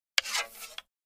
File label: Charges the bow with an arrow; cold weapons